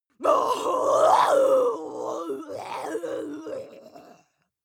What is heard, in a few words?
witch scream groan unequal